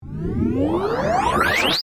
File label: Power Up Ascend, Scifi, Smooth, Digital, Oscillation; Science, Fiction; Spacecraft Sound Effects